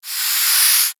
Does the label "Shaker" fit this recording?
No